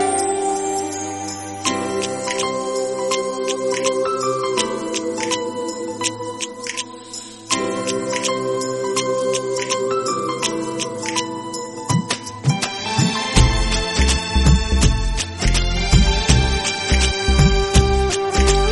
heart beats, ringtone

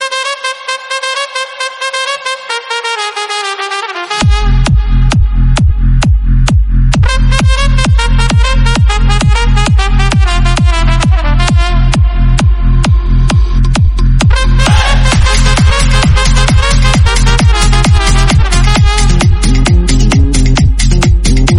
best bgm

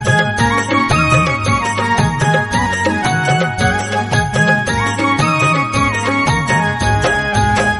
garhwali, ringtone

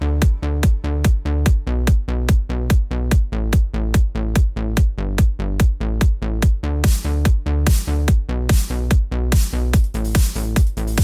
best nokia

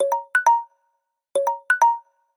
samsung time up mensaje

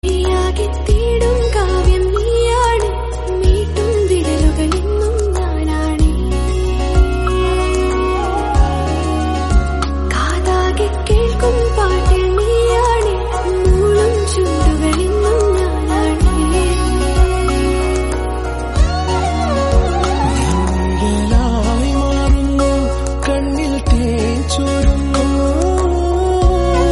melody malayalam

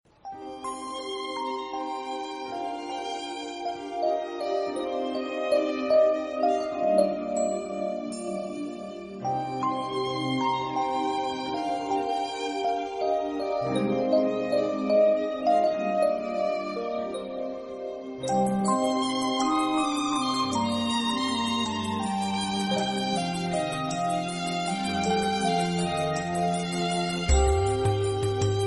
rythm theme

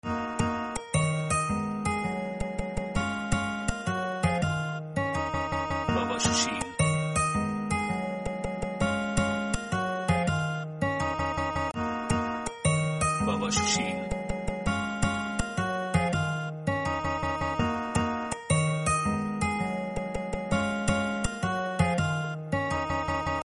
guitar

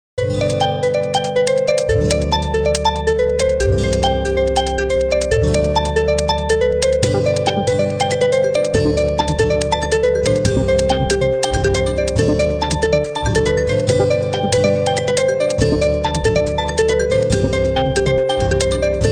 xiaomi redmi note 13 pro 4g

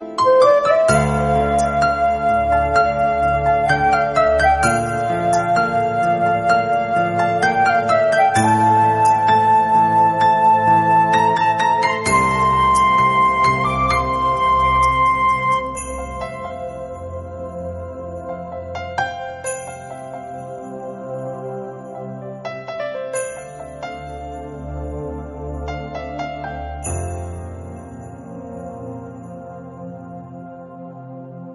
theme music

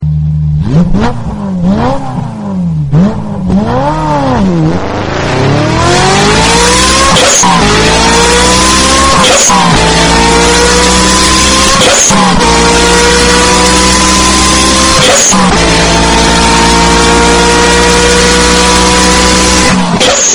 super bike accelerating sound

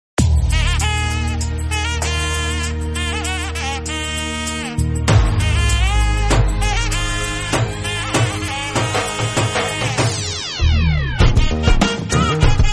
movie bgm, ringtone

